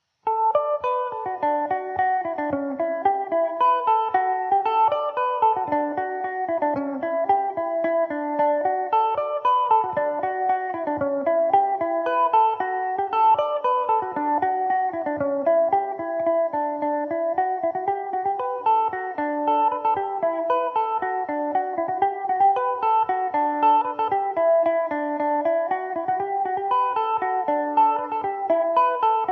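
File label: polka D major revelation, irish mandolin; The Gullane Polka; Revelation Jaguar electric mandolin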